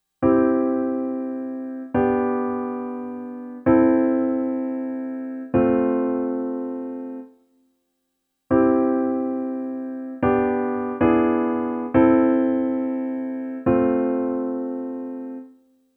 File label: Four chord progression